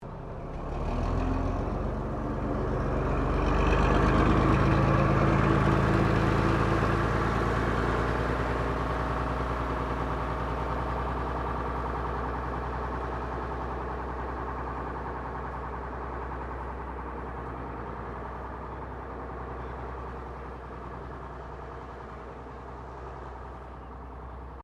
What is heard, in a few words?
Bus Double Decker Bristol VR Drive Off; 1971; Bellarine Tourist railway vehicles; Busses